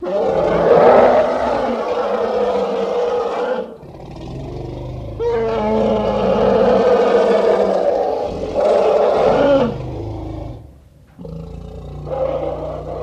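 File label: Elephant Roar Various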